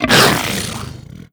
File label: barnacle grab react other; Half Life Alyx